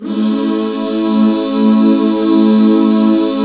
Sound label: A MAJ, Back Chords; Sound & Vision - Gigapack II CD 1